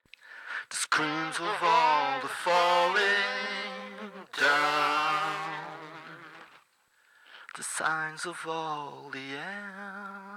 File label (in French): voix